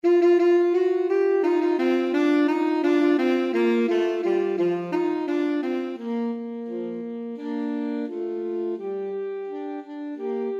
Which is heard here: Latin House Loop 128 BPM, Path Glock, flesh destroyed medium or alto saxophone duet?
alto saxophone duet